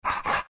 pant big, greevil; npc; courier

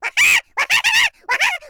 greevil laugh; soundboard

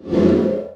blur break; weapons; dota; phantom assassin

